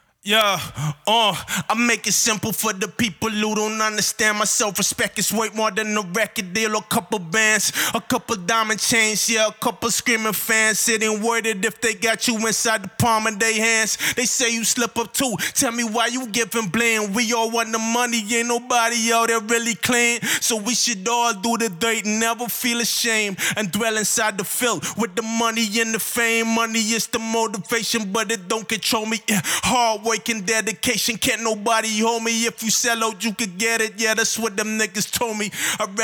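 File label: hip hop 155 A minor, Acapella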